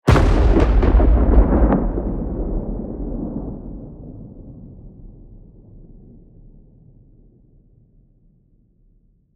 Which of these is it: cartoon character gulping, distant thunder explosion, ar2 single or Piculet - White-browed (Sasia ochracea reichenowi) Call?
distant thunder explosion